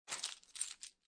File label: Keys Pick Up Off Wood Table, Foley, Keys Picked Up Off Of Wooden Table; Pick Ups